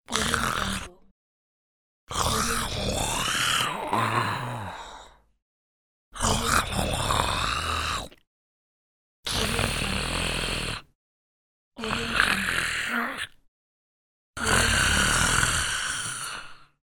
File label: creature growl